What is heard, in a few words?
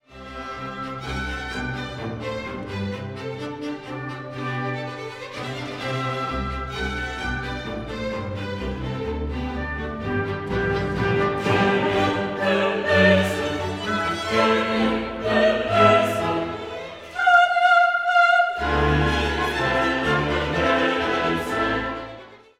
Kyrie Fun for chorus orch